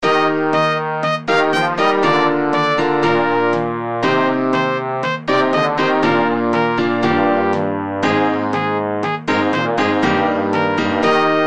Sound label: bgm; kawa